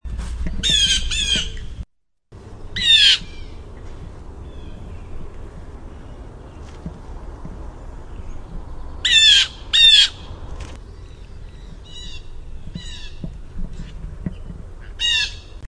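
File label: Blue Jay jeer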